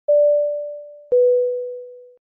airplane ding dong

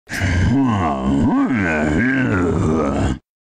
bowsers grunt; super mario

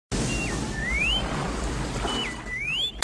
r2d2 sounds; star wars

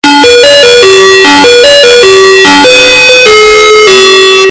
loud swedish icecream truck

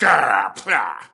grrr pfftblah; smm wario

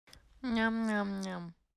nom nom nom nom

